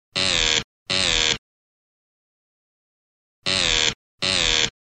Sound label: brazil phone